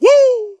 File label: "woo", voice